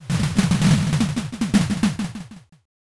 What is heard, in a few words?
steps crowd